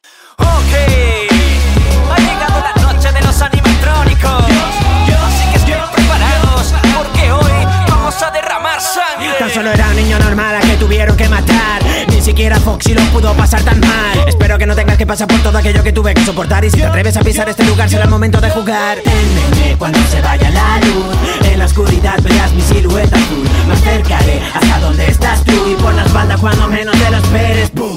Rap

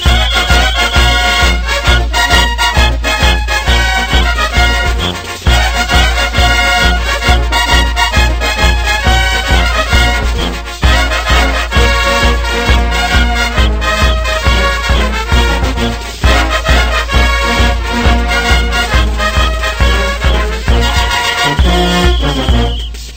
DIABLADA

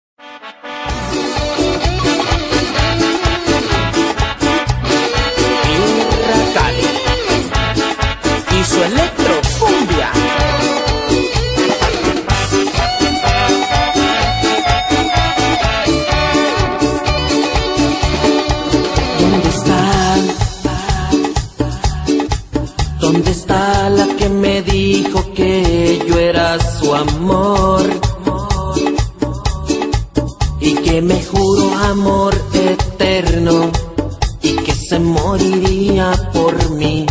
electrocumbia

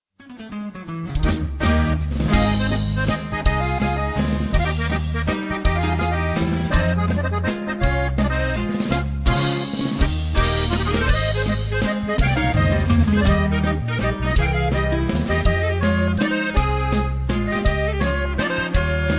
con tuba

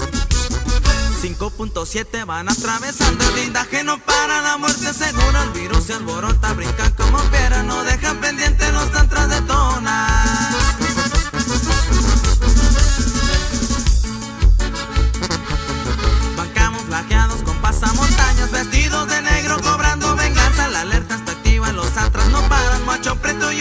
corrido, ringtones latino

